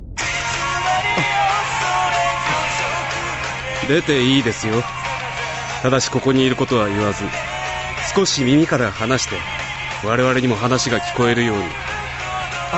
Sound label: sick ringtone